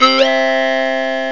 talk box; Terra Sound Library; construction set

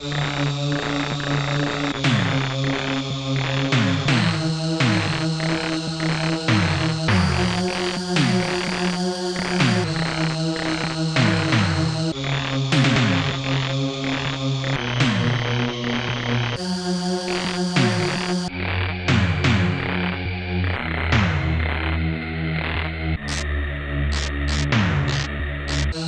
rubbish; moon base

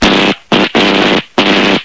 electric

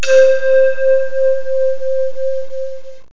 panpipe; Sounds Terrific II; woodwind

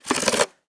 make linen; Immortal

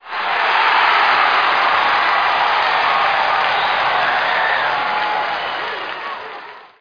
clap, stadium, hockey

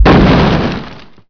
small explosion; SpecOps2 MPlayer Demo

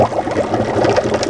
bubbls; Blender Magazine volume1.3 1994; moogly

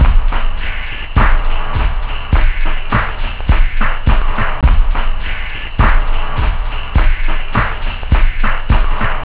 bunker, techno; OctaMED SoundStudio V1

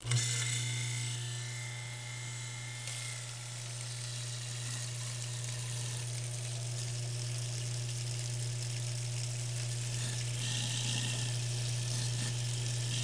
disposal; Corel CD Power Pak: Sound Clips

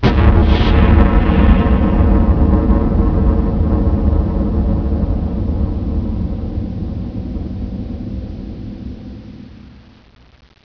Space Blast; WARLOCKSNDS

